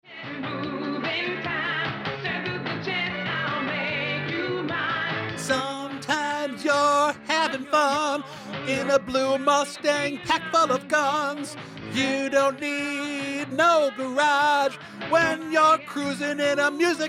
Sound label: music montage